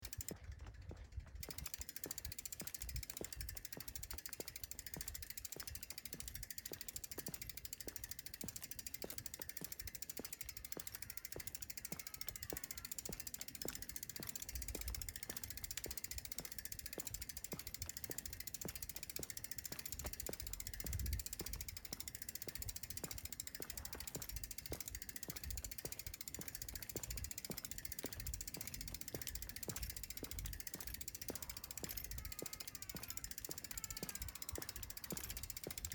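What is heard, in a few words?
footsteps with bicycle; velosipeda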